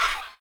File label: imp hit; Risk of Rain